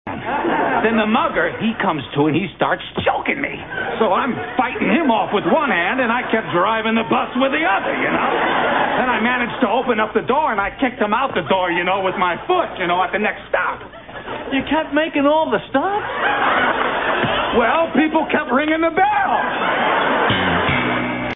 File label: bus